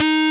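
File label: DISTORTED SYNTH BASS D#4; AKAI S-Series CD-ROM Sound Library VOL-7; STD SYN BASS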